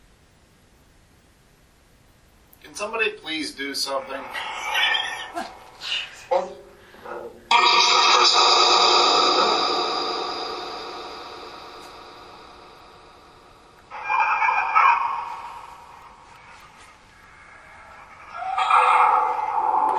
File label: Basement Spirit Box; wildwood sanitarium investigation